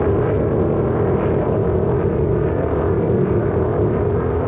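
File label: vehicle float crane loop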